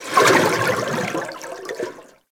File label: Coolant Tank Step